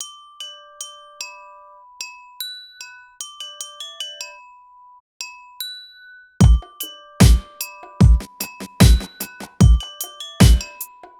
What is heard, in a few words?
075 Bmin, Hip Hop Exotica